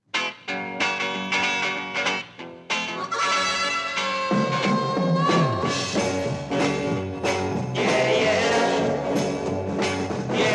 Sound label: choir